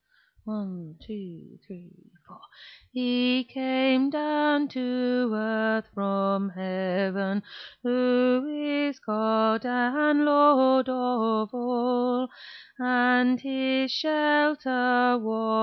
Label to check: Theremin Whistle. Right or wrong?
Wrong